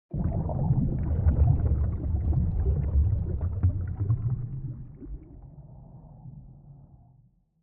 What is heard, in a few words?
Cinematic underwater texture